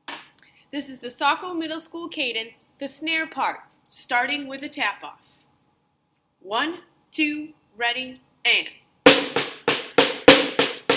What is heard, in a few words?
cadence snare